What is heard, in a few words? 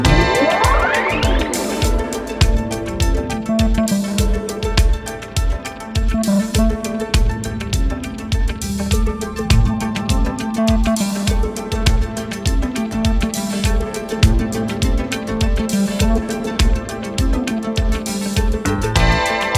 Dub